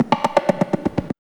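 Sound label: FX conga; E-Lab; X Static Goldmine 1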